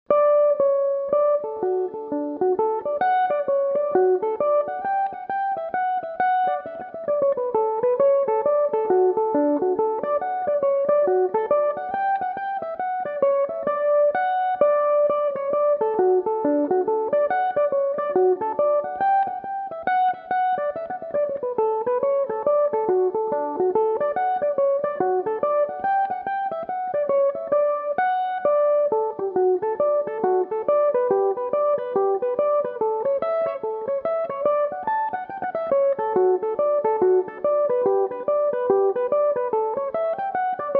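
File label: D major, Irish mandolin; Alexander's Favourite; Belmuse EM-190 electric mandolin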